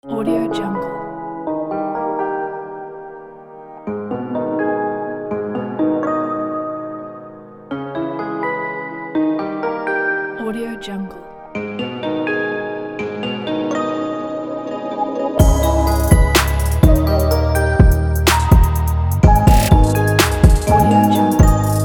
mystical lounge

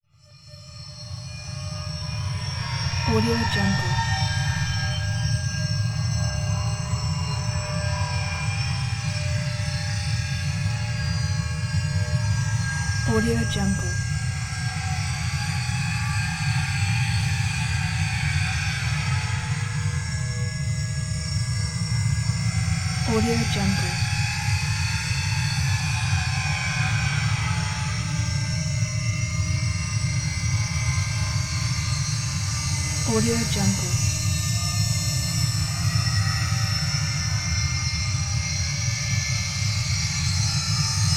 ghost light approaching magical eerie moaning; prosoundfx

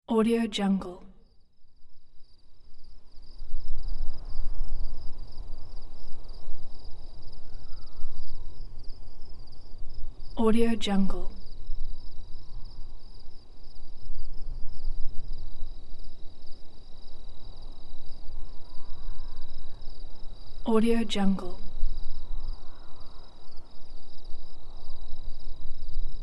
soft night ambience

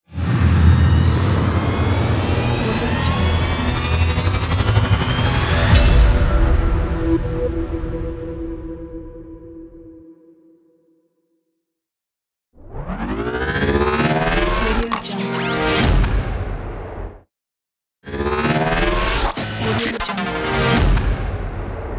techno ident logo bundle

